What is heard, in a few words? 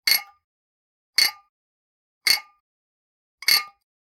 Generic Click Glass Small